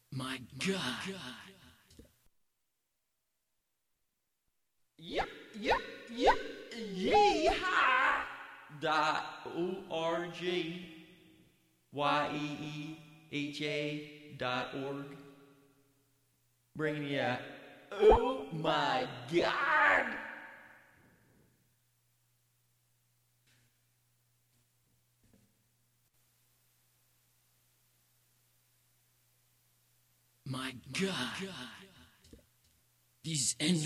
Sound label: 6 string electric